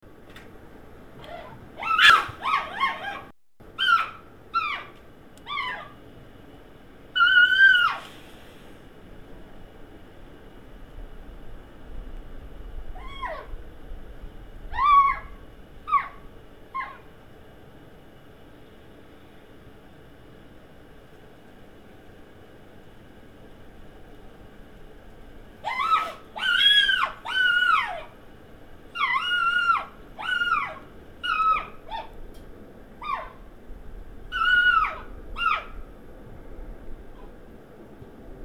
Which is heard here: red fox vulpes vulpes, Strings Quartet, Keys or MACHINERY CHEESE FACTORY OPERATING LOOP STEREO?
red fox vulpes vulpes